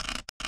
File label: switch; p00gie